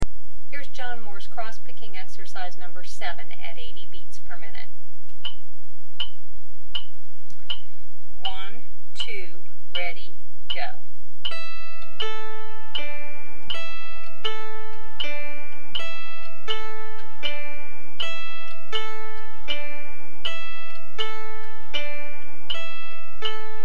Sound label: Crosspicking 80bpm, mandolin